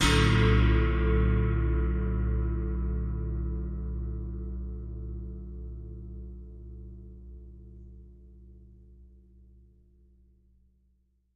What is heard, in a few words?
China 16 Cymbal Medium Tap 2